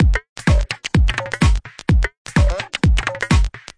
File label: 127 bpm minimal clickfest, drum loops and breaks